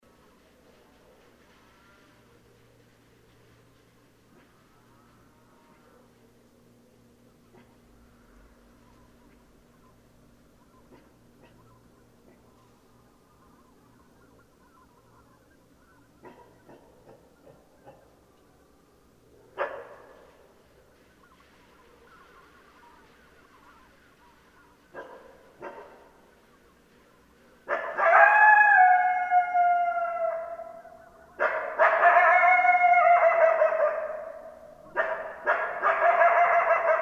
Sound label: Coyotes; Coyotes (Canis latrans)